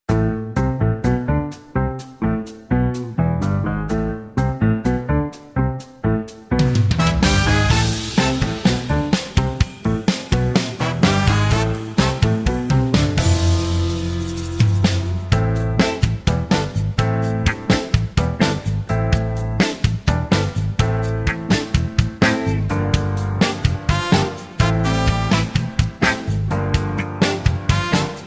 Medley (Record Key) No Backing Vocals

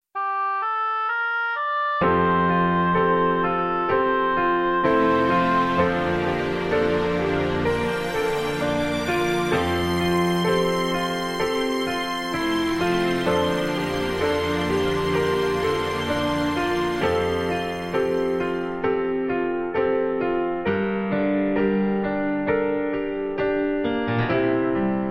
(F To Gb) No Backing Vocals

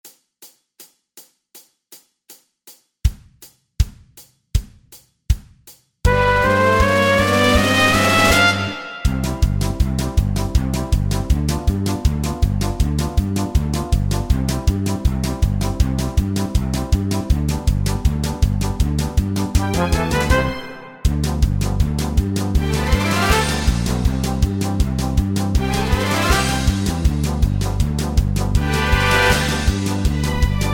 Polka (C)

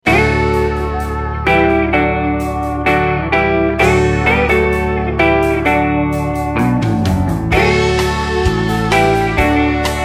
D No Backing Vocals, backing tracks

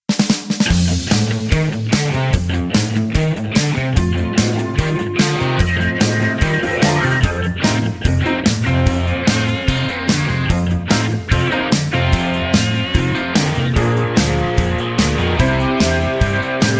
(F) No Backing Vocals

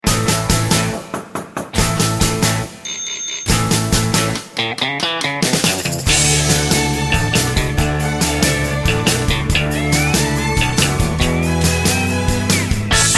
No Backing Vocals

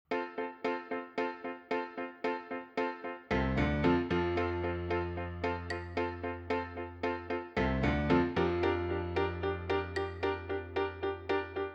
(F) No Backing Vocals

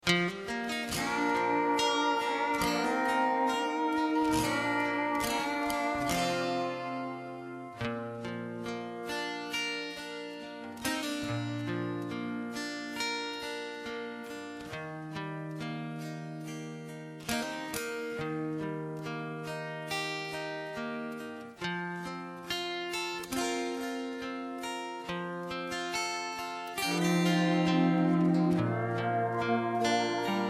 No Backing Vocals

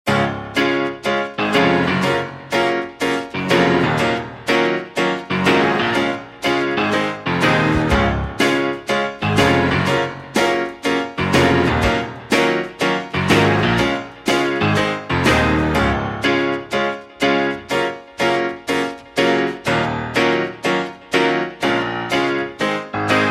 (Db To Eb) No Backing Vocals

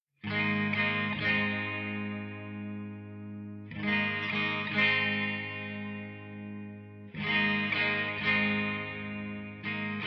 (Ab) No Backing Vocals

